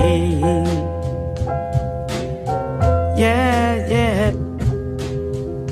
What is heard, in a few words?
voice clunky, loops, phonky